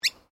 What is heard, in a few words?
Bird Miner Noisy Call Accented Single, Birds in Queensland; Animals